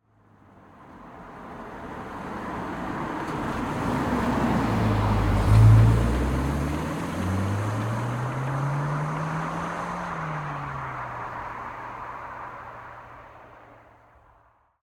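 Vehicle Car Audi Q2 EXTERIOR Pass By Slow Decelerating Then Accelerating; MSD; MKH8040 MKH30; Dramatic Cat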